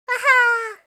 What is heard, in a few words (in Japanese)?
wahaa, awateru